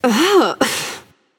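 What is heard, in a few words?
ugh, female; smite; voicepack; hera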